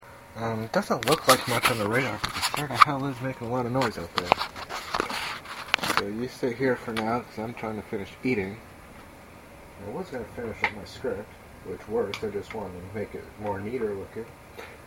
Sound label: thunderstorm